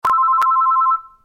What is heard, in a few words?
phone incoming call; sound-theme-pika